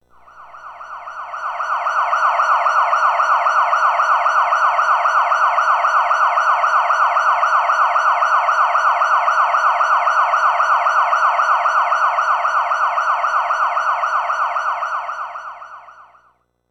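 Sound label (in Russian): звук сирены полицейской машины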